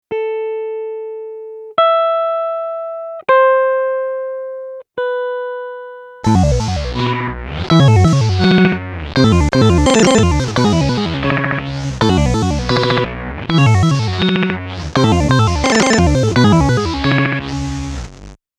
Stutter Arpeggio

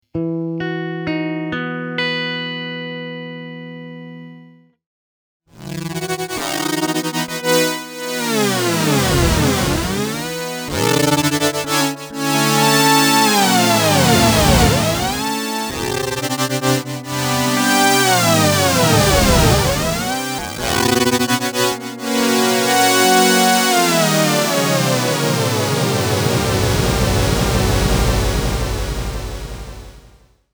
Polyphonic Ring Mod

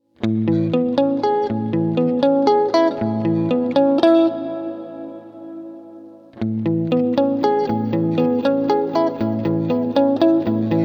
Stereo Octave delay; Hedra; Mercury7

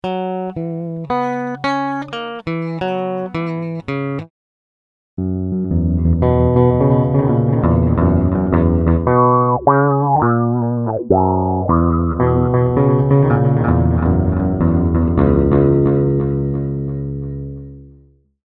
Pitch Shift Delay Envelope Filter Blend

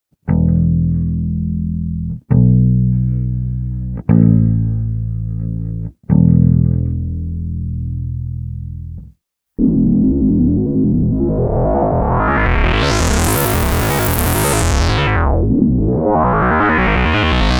Lo Bit Filter Sweep

